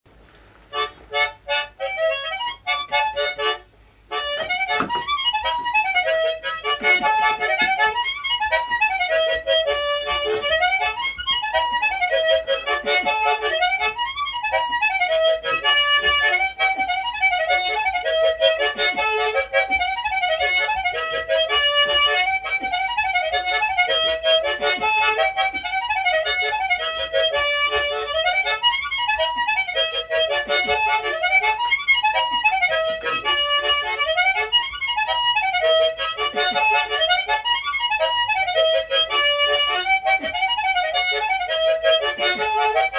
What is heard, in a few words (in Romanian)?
Triliseste la muzicuta; jocuri si cantece batranesti; Vaslui